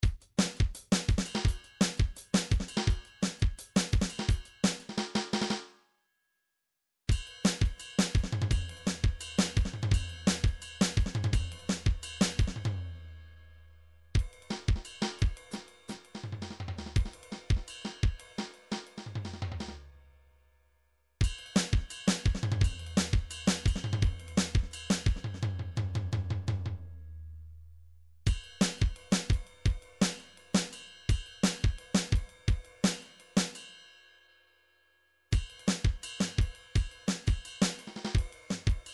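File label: drums cymbal, midi; Paul Kodish Dangerous Drums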